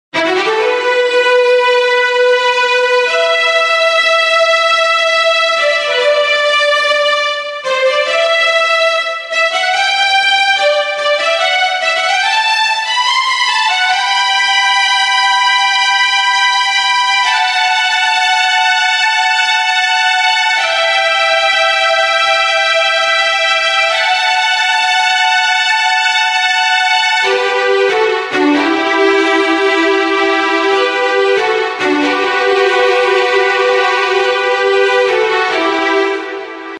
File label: strings; HALion6 : Studio Strings; Passionate